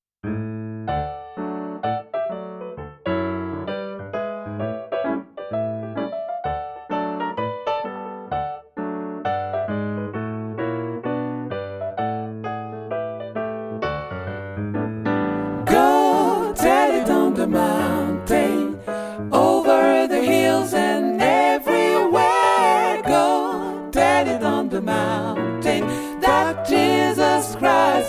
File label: Tutti, Gospel; Go tell it